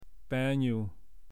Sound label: Snake